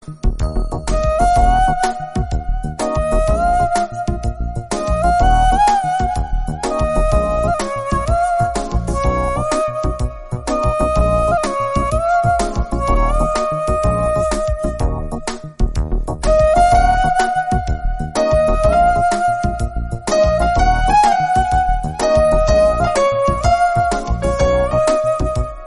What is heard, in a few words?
U1 Bgm Ringtone